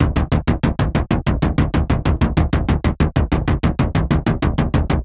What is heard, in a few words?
Sheet Kick 95, horrorscape samples 95bpm